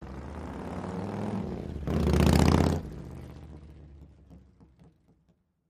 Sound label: Harley driveby